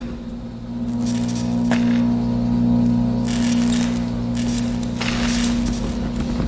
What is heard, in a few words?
wires buzzing, electrical